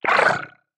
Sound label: wriggler idle; enemies; Factorio